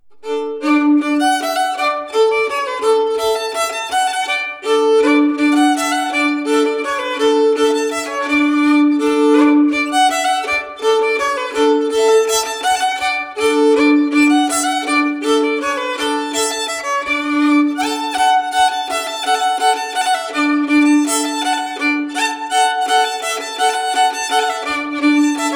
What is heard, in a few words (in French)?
Mazurka